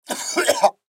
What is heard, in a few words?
Cough Gag Element Male 25 Yrs; Sick; Blastwave